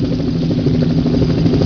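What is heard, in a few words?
helicopter; TRANSPOR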